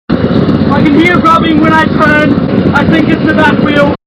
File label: Rubbing on back wheel